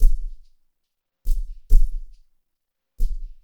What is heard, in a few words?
BBL MICSTAND; Spectrasonics; Liquid Grooves 1 & 2; ODDS + ENDS